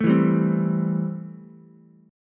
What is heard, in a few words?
C 6th C down Ukulele; C6 strummed